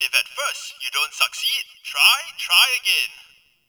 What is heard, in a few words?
Gambling Machine Bark; Warframe; Ambience; NineteenNinetyNine